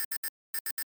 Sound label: bonus blink; pat & mat game; MINIGAMES; XICHTIKY